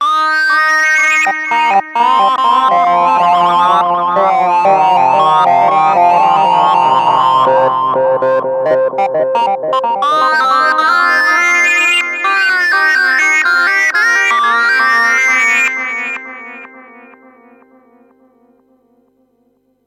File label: Sync Lead, tc electronic Flashback X4